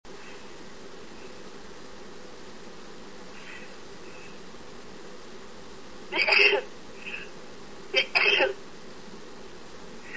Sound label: 3 sneezes, female